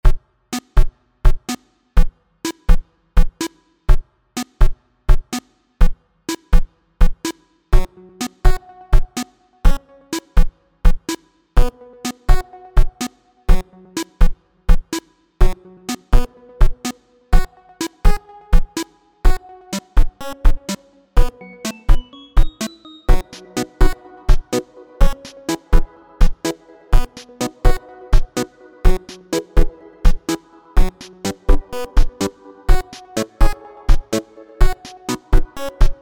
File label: main menu ruins